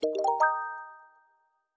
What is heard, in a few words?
success level; fourpics; 4pics1word